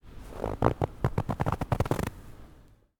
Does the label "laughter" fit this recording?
No